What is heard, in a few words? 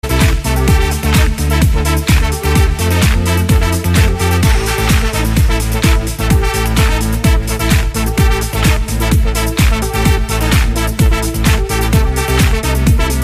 Uber Retro Dance